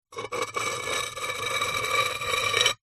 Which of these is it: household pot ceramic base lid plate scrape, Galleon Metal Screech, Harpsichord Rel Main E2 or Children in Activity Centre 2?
household pot ceramic base lid plate scrape